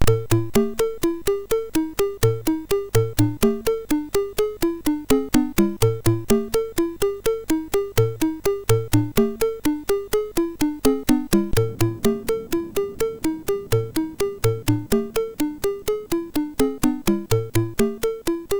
Bassline, MOS6581R4; sid

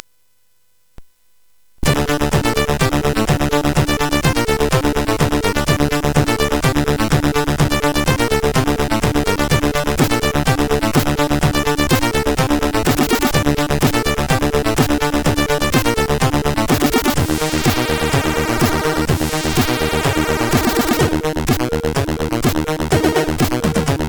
Intro, CSG8580R5; X-ample; hvsc

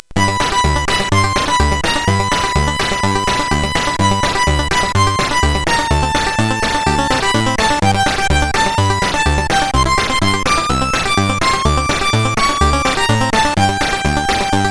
Synth Sample, CSG8580R5; hvsc

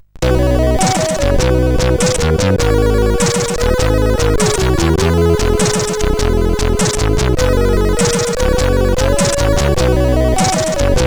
Triad Intro sid CSG8580R5

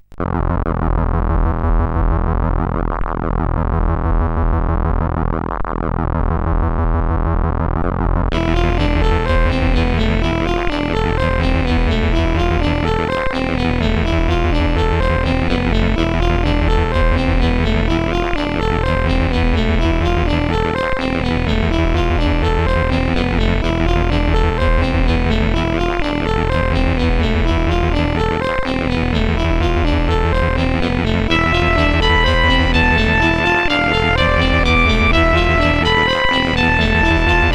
X Jingle, CSG8580R5

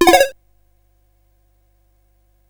Game Effects, sid CSG8580R5; Morton Adam

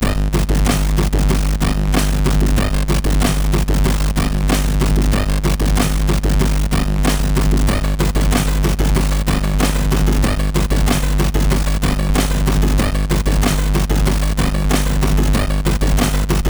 Techno Rap, sid MOS6581R4

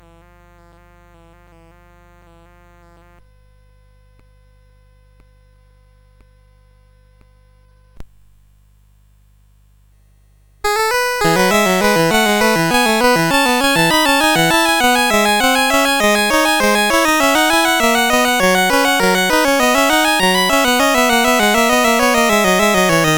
Bourree, CSG8580R5; Joseph A Grau; Sixth French Suite

